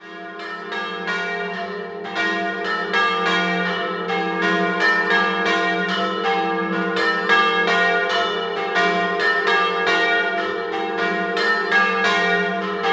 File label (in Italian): Canussio Doppio, campane